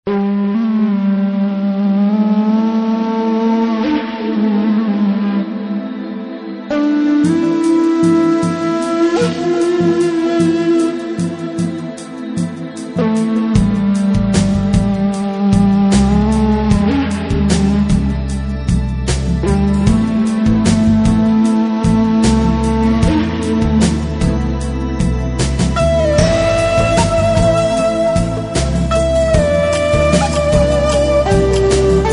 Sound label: Shakuhachi Dance